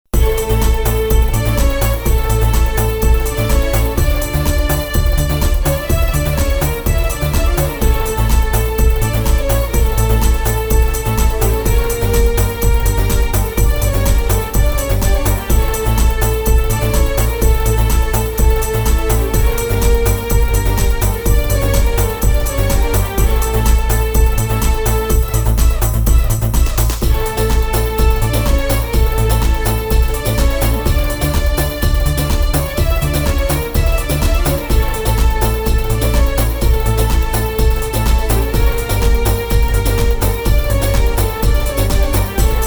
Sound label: Arabian Dance Mix